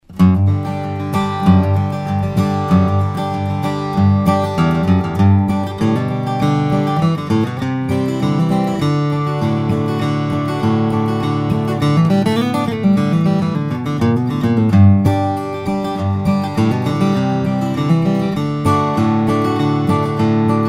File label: steel-string guitar, flattop; flammang rs35 dreadnought